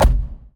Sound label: ball collision geo low